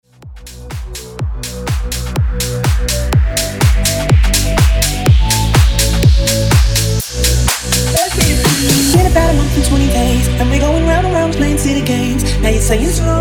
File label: House Transition